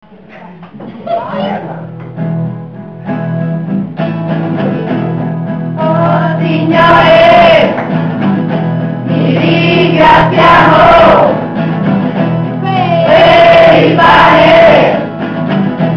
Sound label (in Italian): ti ringraziamo oh yeah